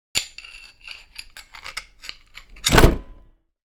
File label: Pry Door Wood; Scrap Weapons; Zomboid